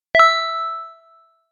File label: text receive; Sound design for the opera composer: concepts and methods; Vicky and Albert audio cues